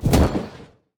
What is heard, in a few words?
enemy shadow attack; sfx